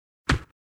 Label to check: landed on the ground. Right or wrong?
Right